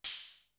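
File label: noise hat, cymbal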